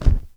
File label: Wing Flap Flag Flapping